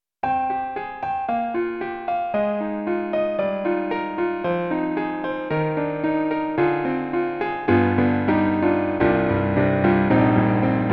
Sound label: Roland SonicCell FX Piano